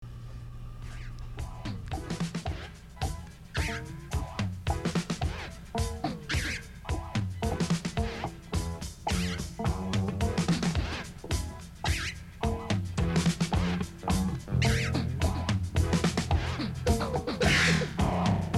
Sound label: Garbage Disco